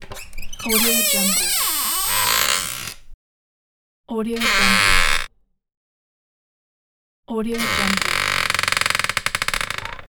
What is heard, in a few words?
creaking door